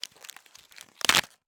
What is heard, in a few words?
Cardboard, honeycomb, crush, collapse, fiddle; Eiravaein Works; Vaeyan IV